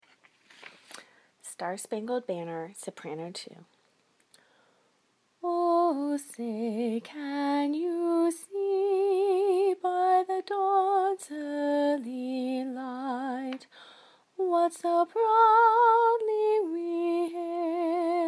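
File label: soprano; star spangled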